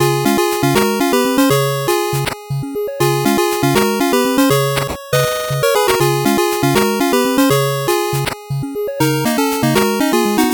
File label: 8bit bgm slow